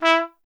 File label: TP E; Miroslav Vitous - Solo Instruments VOL-1; Trumpet